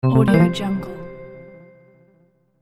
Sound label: hints